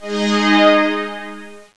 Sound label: RATING